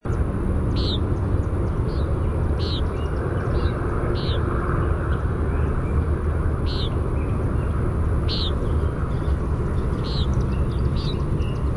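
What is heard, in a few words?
Common Nighthawk peent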